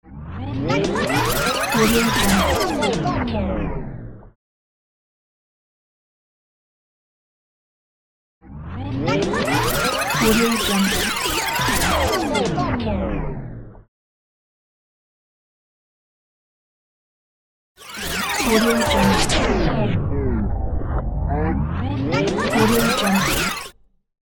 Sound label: scratch rewind